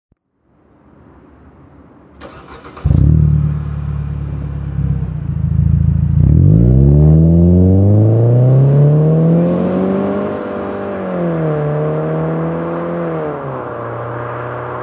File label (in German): 4 Zyl Start und Losfahren